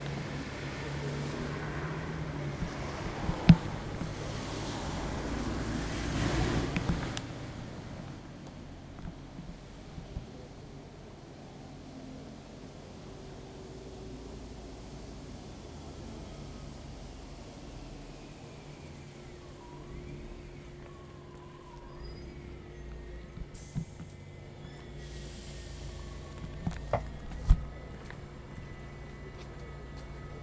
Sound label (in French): Nantes - Matin - Tram arrive; Ville; ZoomH2N